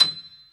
PF B 6, PIANO; AKAI S-Series CD-ROM Sound Library VOL-8

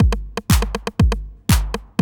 loop, Roland; Sound & Vision - Gigapack I

